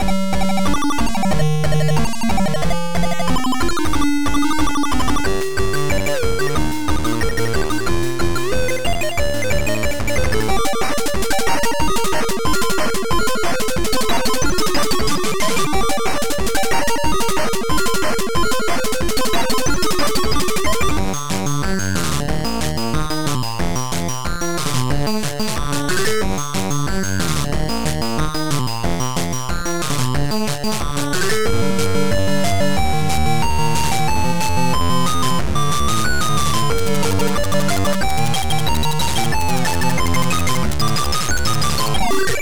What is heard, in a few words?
Techno Remix; Hacker KAY; Inspire